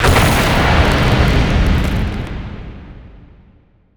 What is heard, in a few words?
glacier; weapons; dota; drow ranger